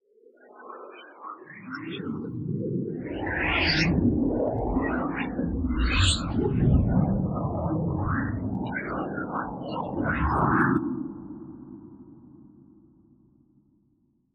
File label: Red Sprites Alien Texture